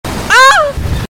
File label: moaning; sundrop